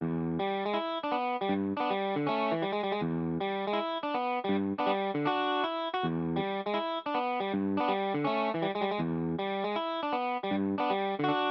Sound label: out of time, metronome; use a metronome